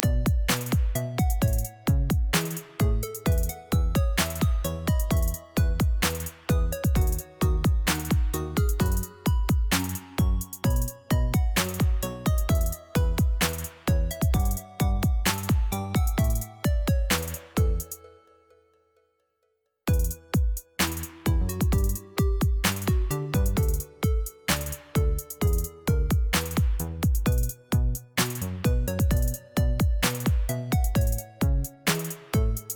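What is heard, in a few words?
Home background music; Ludo-3D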